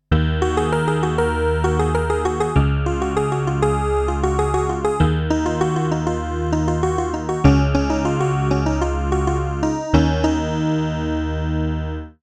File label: Arturia SQ80 V ESQ1 Toy Pi